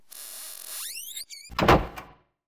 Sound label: close dor